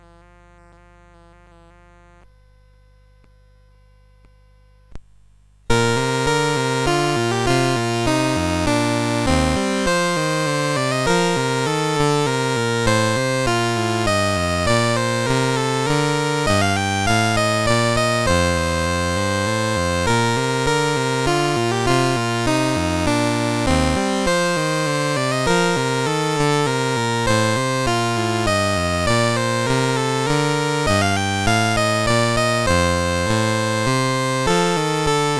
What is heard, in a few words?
Minuet in B Flat, CSG8580R5